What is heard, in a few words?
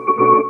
Chain FX; Jungle; Blu Mar Ten - Jungle Jungle - 1989 to 1999 Samplepack